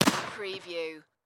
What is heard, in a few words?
Shotgun Remington 870; sound effects bible